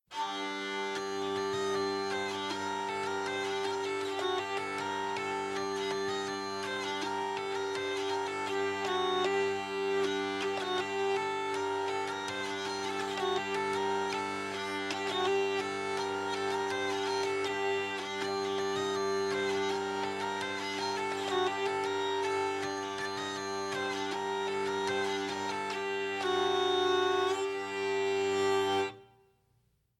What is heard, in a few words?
Tavern; Assassin's Creed Unity